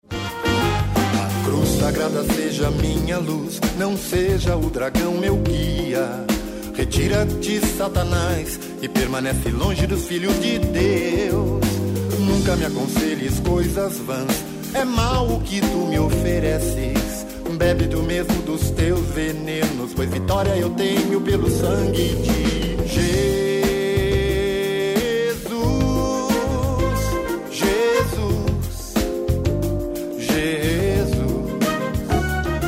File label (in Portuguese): canto de vitoria